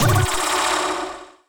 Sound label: magical attack